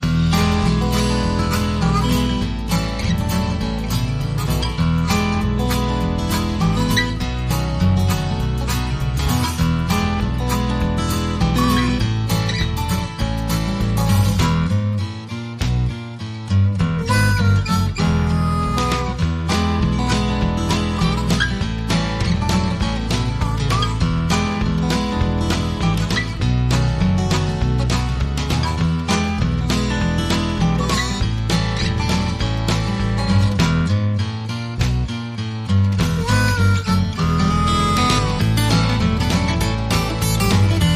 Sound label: Old Hound Dog Shuffle